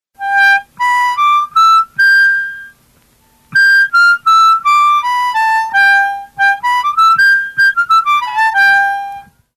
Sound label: blues lick, garmoshki